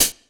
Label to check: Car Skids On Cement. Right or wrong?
Wrong